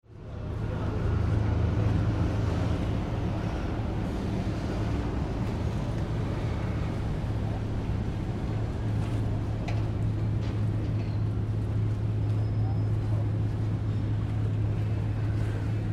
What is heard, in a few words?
Amsterdam Harbor Ambience, Between city, sea and canals. People, boat; People, boats, general city ambient in background.; Noise Sound Effects